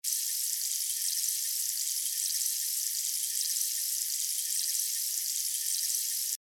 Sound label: rainstick ambient